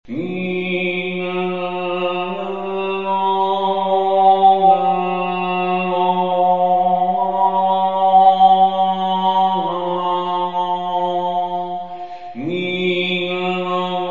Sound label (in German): Oberton